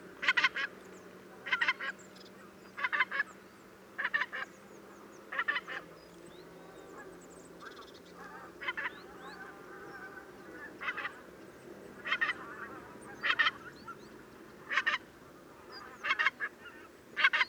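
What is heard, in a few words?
tundra bean goose rossicus flight call honk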